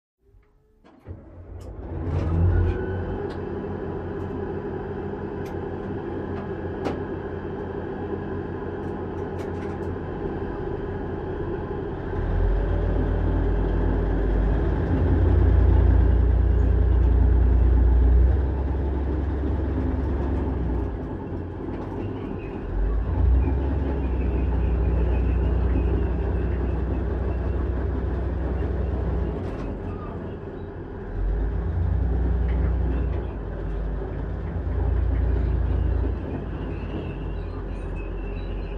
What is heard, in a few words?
M60 Tank Int Startup